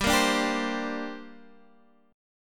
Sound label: C 7th Suspended 2nd, guitar